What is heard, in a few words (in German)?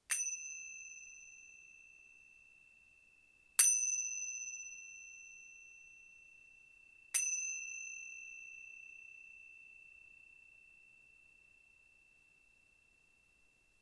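zimbeln tibet mittel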